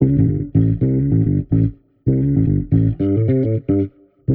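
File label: 110 bpm A, warped bass; Loops; Papa Knows; Touch Loops; CosmicFunk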